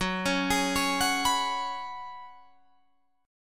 F sharp Suspended 2nd Suspended 4th, Guitar Drop D